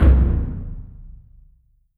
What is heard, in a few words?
oo daiko, taiko; AKAI S6000 CD-ROM - Volume 5